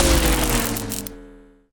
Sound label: tesla big release, weapons; hunger